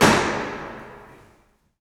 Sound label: BIG FEET, Impacts, Hits & Booms; Distored Reality